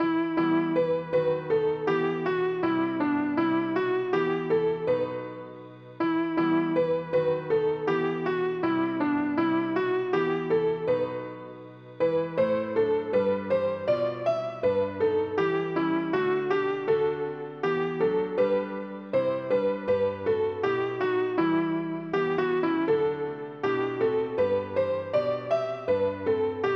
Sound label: God rest ye merry Gentlemen Sopraan; Kerstmuziek; Langzaam repetitie tempo